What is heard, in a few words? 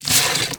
arrow grab from quiver, Medieval Weapons Sound Effects